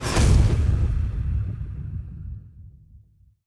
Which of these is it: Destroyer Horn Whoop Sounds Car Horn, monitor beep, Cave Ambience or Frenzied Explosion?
Frenzied Explosion